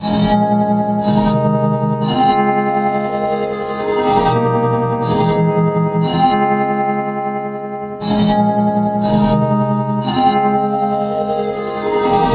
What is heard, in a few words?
electric dyno bowstring; electricdyno mkv 2.0